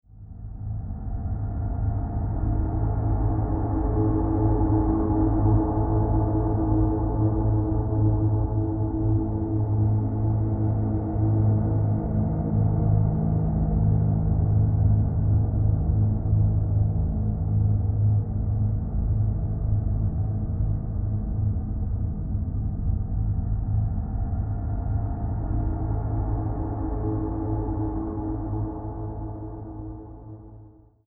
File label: Drone Dark Suspense; iMovie '08 Sound Effects